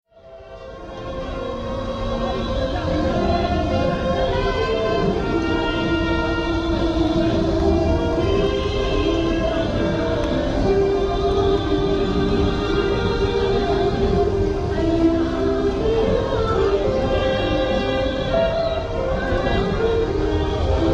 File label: chengdu park noise